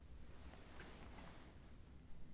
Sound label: getup bed; blindside